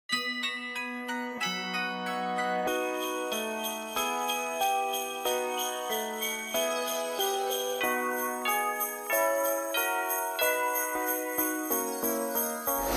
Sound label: tune